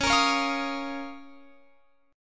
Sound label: Db 6th Add 9th Db down Guitar Open F; Db6add9; strummed